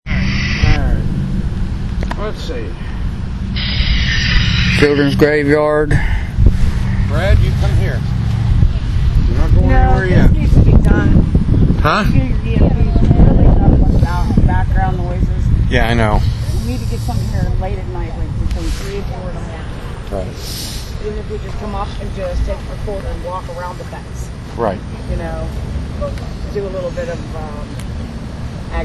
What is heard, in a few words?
strange noise